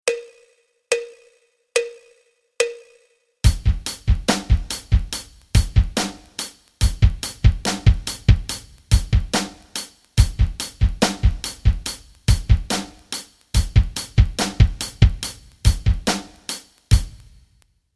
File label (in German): rock rhythmen